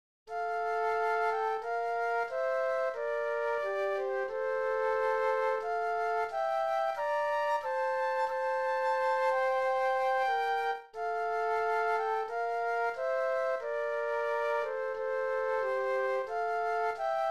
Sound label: Flute Duet; christmas